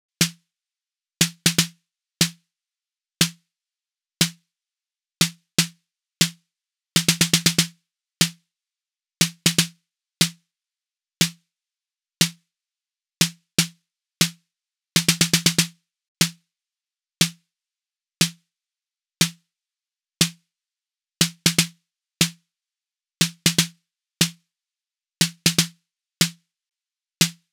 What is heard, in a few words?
Roland TR 08 Snare